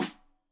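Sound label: Snare Stereo, Drums; Deeply Sampled Drums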